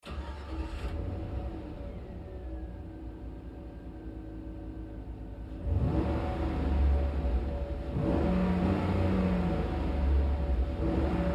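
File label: Truck GMC Savana Interior Start Revs Off Hard; 2010 GMC Savana; Trucks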